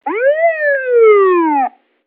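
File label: zvuk sireni policejskoj mashini, police car siren sound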